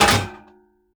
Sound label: floor grill deploy; Rust